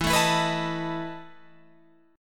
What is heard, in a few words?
E Major 7th Suspended 4th, Guitar, Open F; EM7sus4 strummed